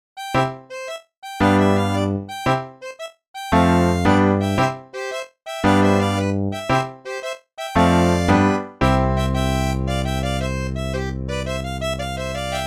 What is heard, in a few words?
rondeau gascon